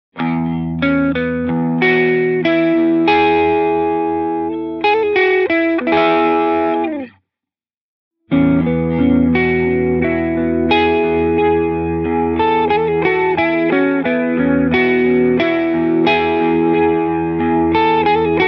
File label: Analog Tube BBD, echosystem